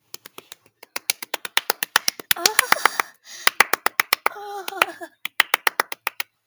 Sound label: sus claping